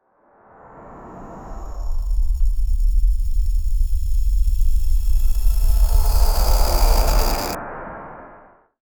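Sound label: sound effect echoes of the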